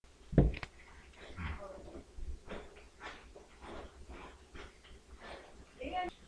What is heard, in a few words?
cry